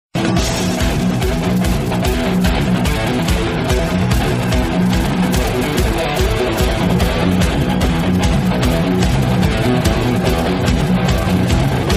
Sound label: instrumental